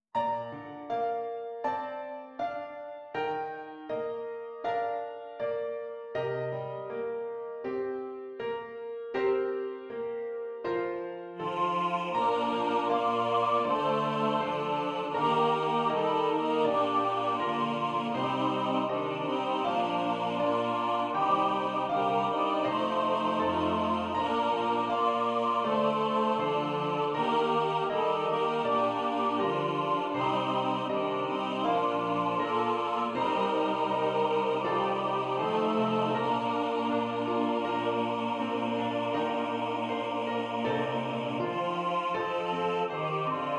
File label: Snow Is Falling SATB